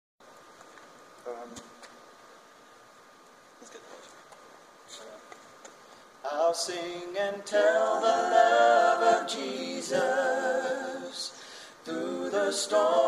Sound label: singing chorus acapella; Tell the Love of Jesus